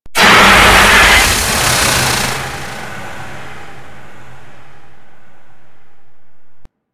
Golem Death; Warframe

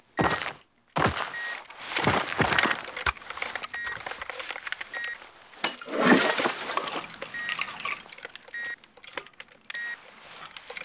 Foley Tent; Quests; The New War; Grineer Eidolon Shotgun; Kahl

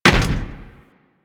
Step Default, Footsteps; Warframe

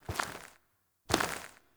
Beam Battle Foley; Quests; The New War; Ballas Lotus

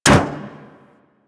Ship Hit Effects; Warframe; DE; Vehicles; RailJack; RailJackHitEffects

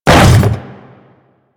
Hammer Boss Step Default, Footsteps; Warframe; Grineer

